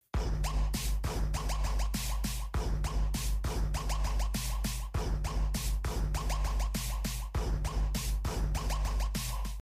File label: Beats Tweets Phase